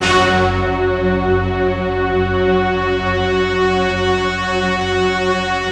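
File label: F#3, STACCATO ORCHESTRA; Best Service; Advanced Media Trax Modern Composer; STACCORCH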